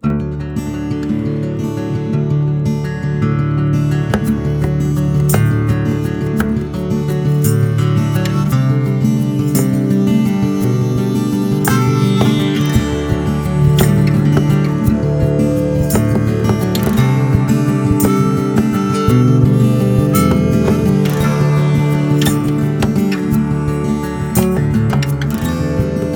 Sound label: Bounce With Bongos Outro